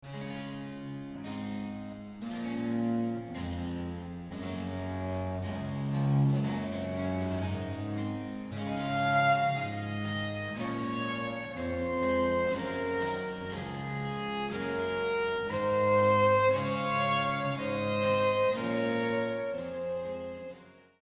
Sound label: canon; pachelbel; el barroco